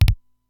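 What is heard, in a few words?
Simmons SDS-9 bass drum; Factory B4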